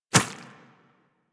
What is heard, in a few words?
Infested Small Walk Default, Footsteps; Warframe